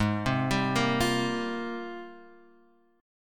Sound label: Ab Major 13th, AbM13 chord; arpeggiated; JGuitar